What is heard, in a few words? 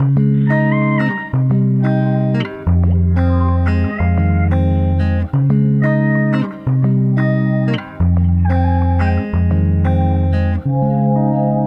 90 BPM F Min, Guitar Loops; Cymatics; Orchid Sample Pack